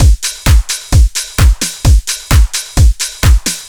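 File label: 80s Flavoured C, drums eurodance; ударные и барабаны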